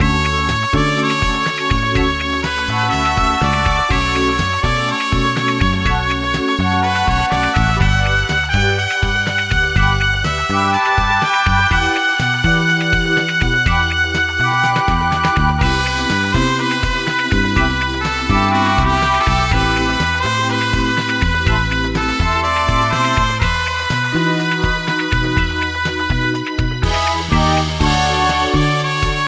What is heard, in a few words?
GuitarMusic